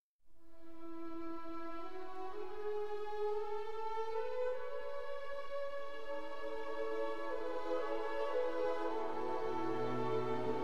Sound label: Piano Concerto No. 1 in E Minor, Romance Larghetto